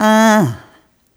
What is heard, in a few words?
Rhythmic A